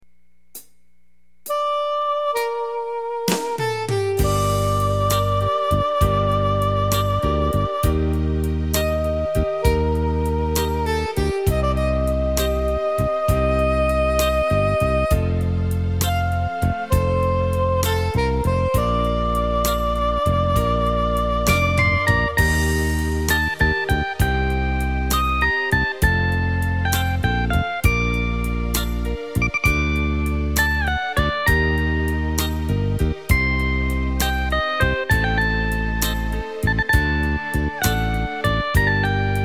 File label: slowrock; yamaha